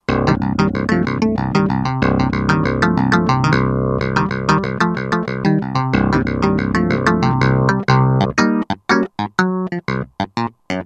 Mute Clav; '60s & '70s; Roland SR-JV80-08

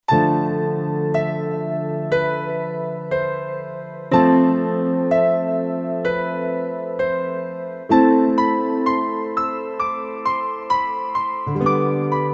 Fluid Grand, Kurzweil K2500X; Factory Programs

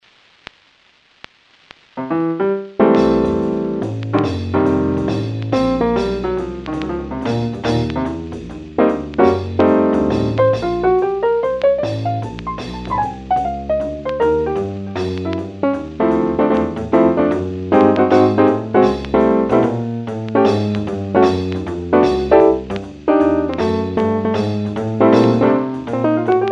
Lo Fi Jazz; LoFi Jazz Comb

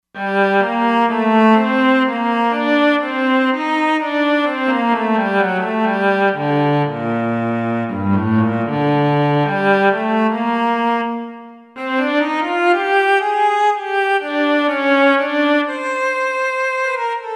Cello

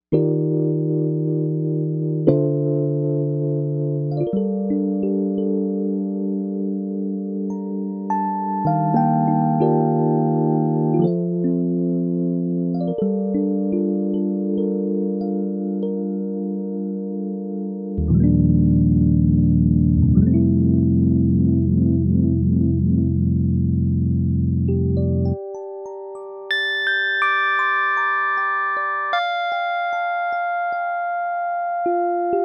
Rhodes; Novation UltraNova; Factory Patches

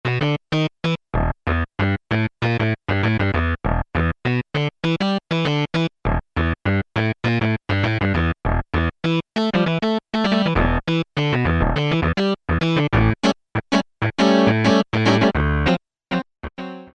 CLAV, Ensoniq ESQ-1

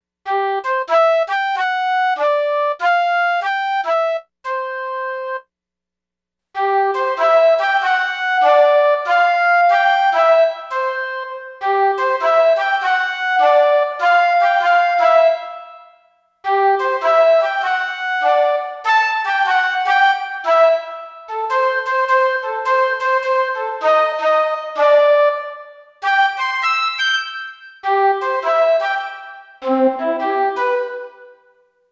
SMALL HALL

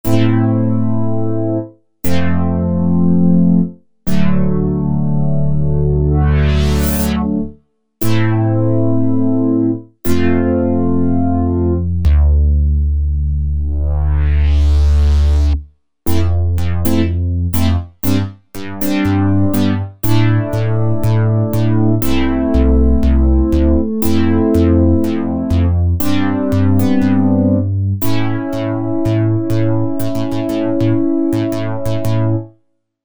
SYNTH BASICS; Star Sweeper; Apple GarageBand 2

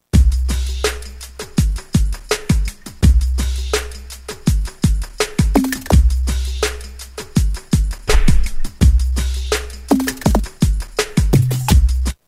Easy R&B 83; Roland M-DC1

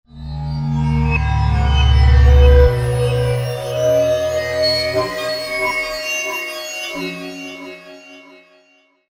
Spectre, Roland V-Synth

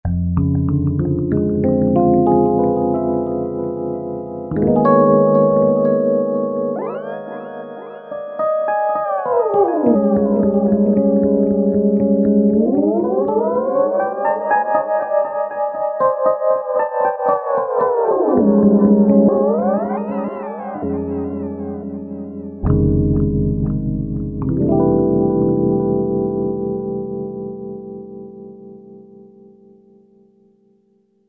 Vib E-Piano, Roland V-Synth; Factory Patches

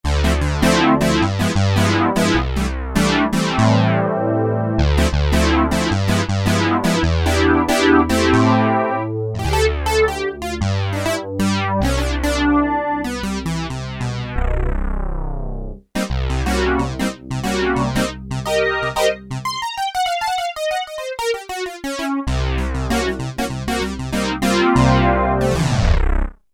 reso synth; sampler; Guess the sampler game

